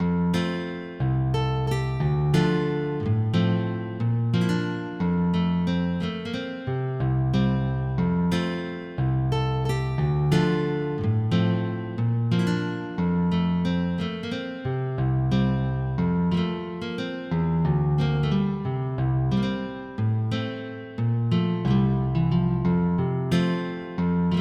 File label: Harp way sarabande; MIDI